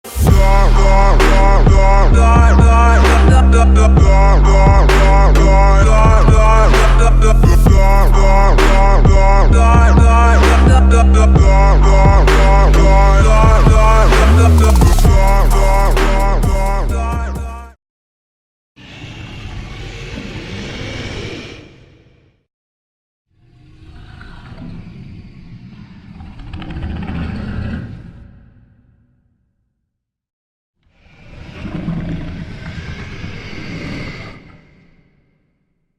cris d horreur sound effect

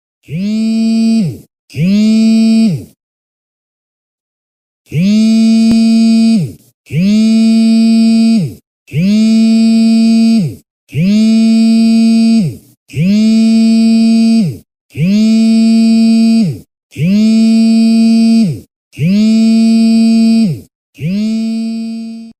bruitage vibreur téléphone

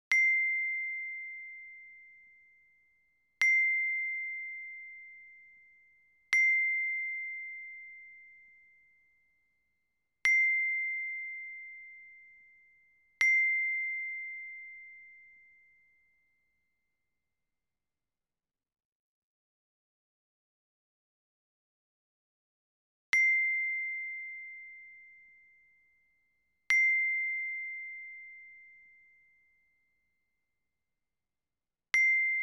Echanges SMS

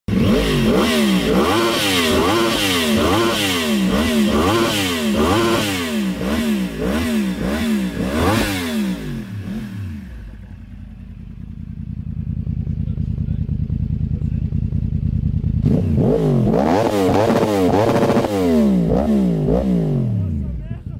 rupteur moto

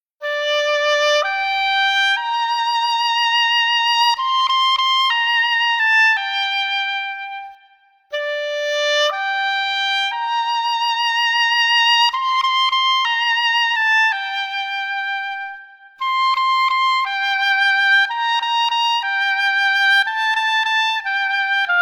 Hautbois

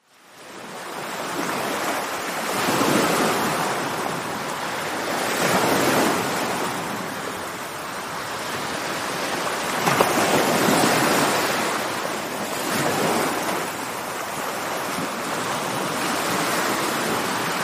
bruit de la mer et des oiseaux; paysage romantique

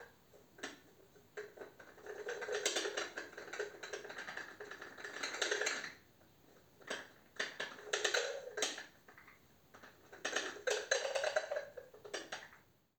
Son loin

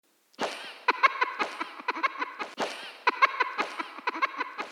rire Reverb

